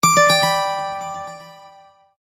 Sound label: next tone